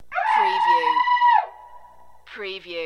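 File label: Female Scream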